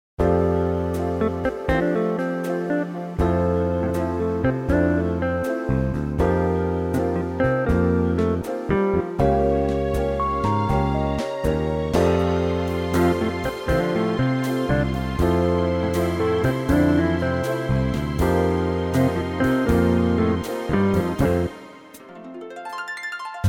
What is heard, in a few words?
Lounge Jazz